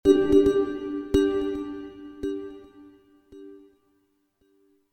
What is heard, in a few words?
Synth Chime